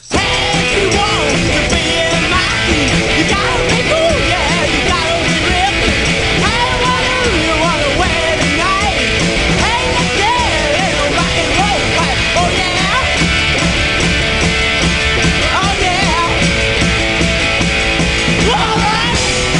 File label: menu music; ESPN College Hoops 2K5; xbox